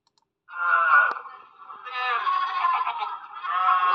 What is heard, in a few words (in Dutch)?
moeflon